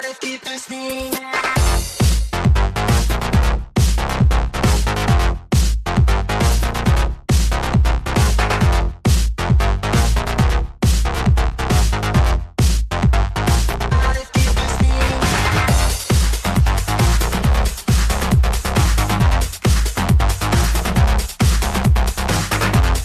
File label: Tecktonik